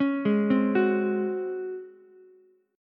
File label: C sharp Major 7th Suspended 4th C sharp Ukulele; arpeggiated; chord; 6,8,8,9; JGuitar